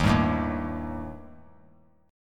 Eb Major Flat 5th Eb; EbMb5 strummed; 15,14,12,13; JGuitar

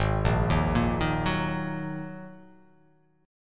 G sharp 7th Suspended 2nd G sharp

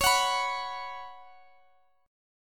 C sharp 7th Suspended 4th C sharp, Guitar Open D

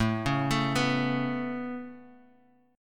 A Major Add 9th A Guitar Open F, chord; Aadd9 arpeggiated; JGuitar

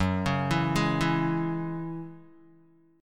F sharp 7th Suspended 2nd F sharp down Guitar; F#7sus2 arpeggiated

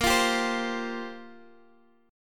C 7th Suspended 4th, guitar

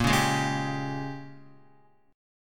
A sharp Minor 13th A sharp down Guitar, chord; strummed; JGuitar

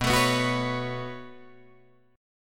B 7th Suspended 2nd, Guitar, Drop D

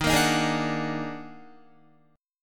Eb Minor Major 11th, Guitar; strummed; chord; 11,13,13,13,14,13; JGuitar

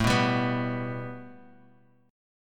A 7th Suspended 2nd Guitar Half Step Down

